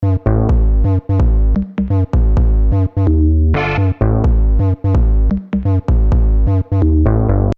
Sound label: riff